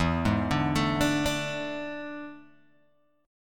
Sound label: E 11th, Guitar, Open G